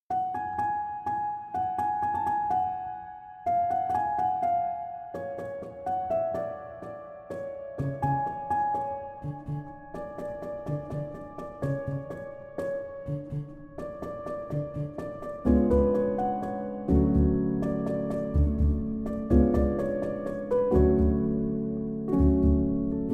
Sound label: piano instrumental